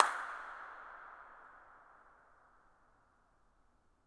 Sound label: incinerator dirac